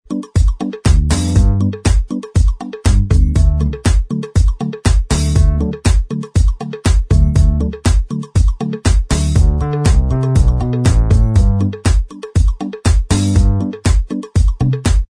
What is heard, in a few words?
Inst Track